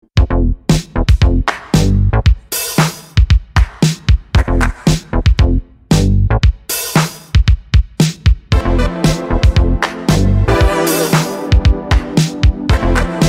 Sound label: e funk synth